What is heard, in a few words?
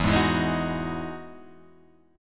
E Major 9th E down Guitar Open G